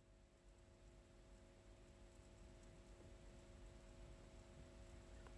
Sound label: sub hum; sonos